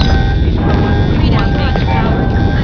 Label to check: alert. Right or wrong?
Right